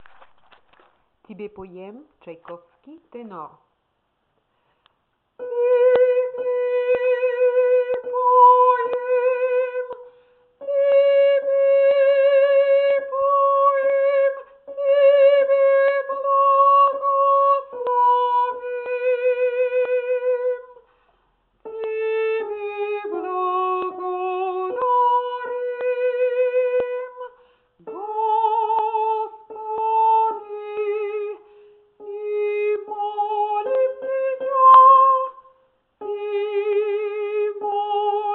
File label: tenor; tebe poem